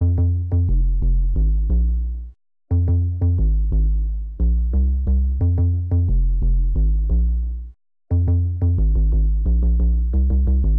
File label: Synt; TOB STUDIO